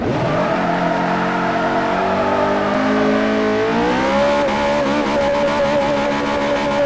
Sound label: rev, vehicles; 458; TDMCarpack; TDMCars